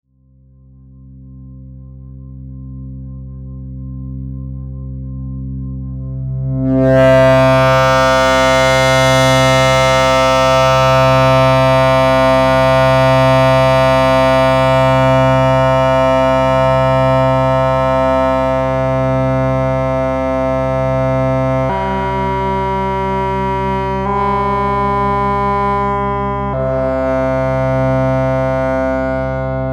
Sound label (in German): Tuned Noise mit DelayReverb Pittsburgh Filter und VCA